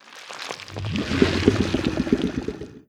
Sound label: idle subtle, barnacle; Half Life Alyx